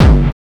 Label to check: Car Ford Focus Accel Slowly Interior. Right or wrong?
Wrong